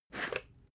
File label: water boiler pick up slide